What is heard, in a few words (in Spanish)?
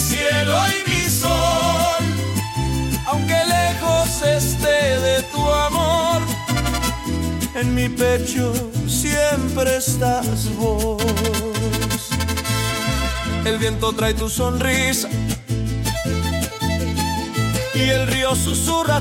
mariachi romantico